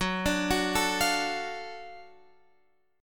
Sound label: F sharp Minor Major 7th Sharp 5th F sharp down